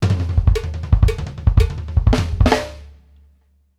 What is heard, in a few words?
COWBELL L, Drumworks FILLS 84; Sampleheads; New York City Drumworks VOL-1; KD FILLS 84